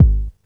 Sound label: SK, Kicks